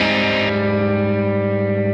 Arp. x 4 5 5 x 4 Abmaj7

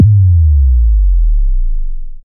down sweep; osu

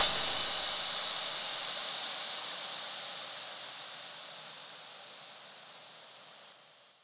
crash; osu

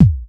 kick beat drop

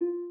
Harp

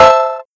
soft hit whistle; osu

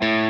Arp.

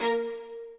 strings

